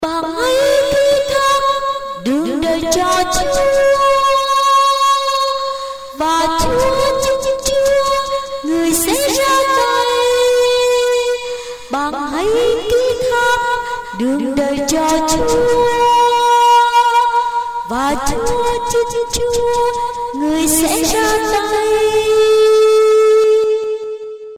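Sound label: soprano alto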